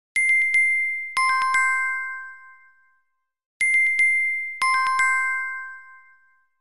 galaxy watch glassy tone

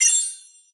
count starkeys

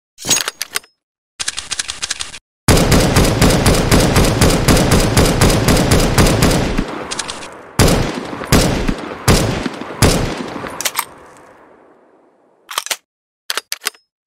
g3sg1, zvuki opuzhyia; csgo

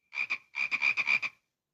zte notification frog

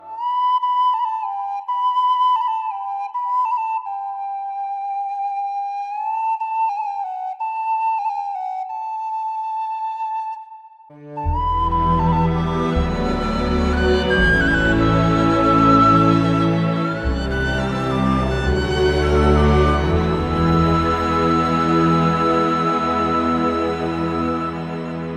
sopilka; mavka

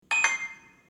coin

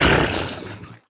squid death, Minecraft

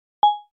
video record

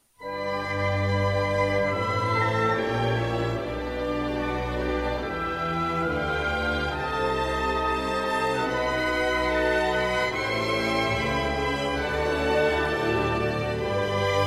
anthem

